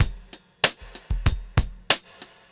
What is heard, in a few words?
Todd 095; Zero-G; Monster Beats